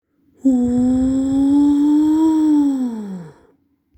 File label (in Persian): صدای فالستو